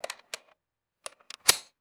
metallic rifle stock unfolding and locking; guns

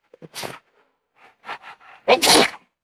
small dog sneezing sniffling and panting; animals

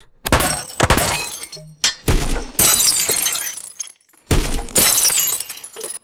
multiple bullet impacts shattering glass indoors; bullets

